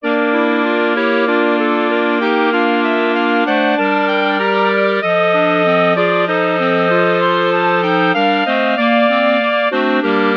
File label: evening song for clarinet quintet